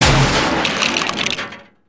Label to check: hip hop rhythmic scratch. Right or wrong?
Wrong